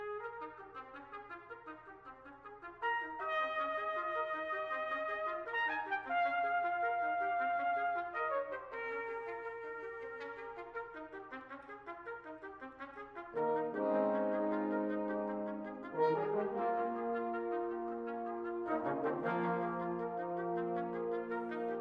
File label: Concerto For Trumpet, Brass Ensemble & Percussion, Allegro